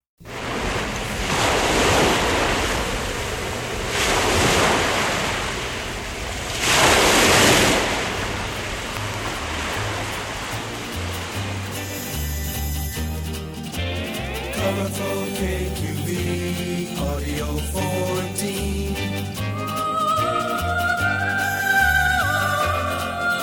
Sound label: KQV Surf Jingle; Series 27